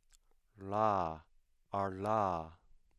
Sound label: voiced retroflex lateral